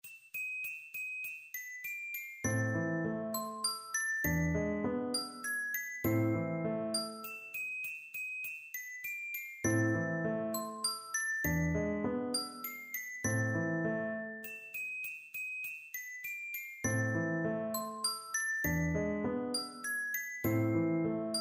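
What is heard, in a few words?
glockenspiel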